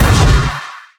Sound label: mystic flare target; weapons; dota; skywrath